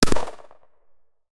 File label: single mp5 9mm gunshot, gunshot